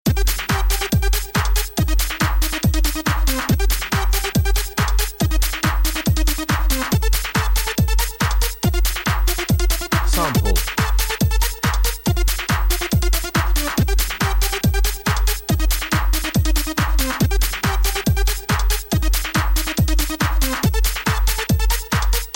techno, backing

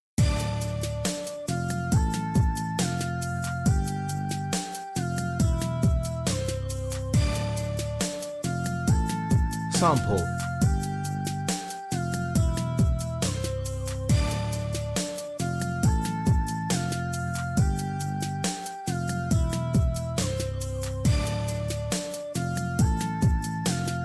hiphop

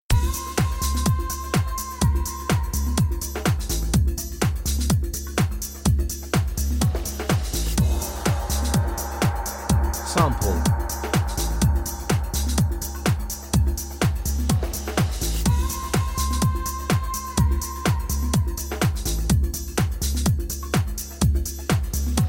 dance house

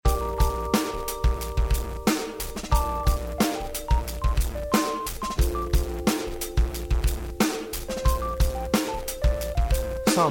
beat

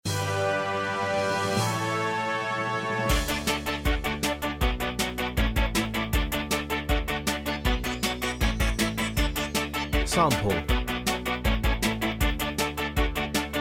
pop

